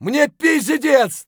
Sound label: hurt neardeath; Escape from Tarkov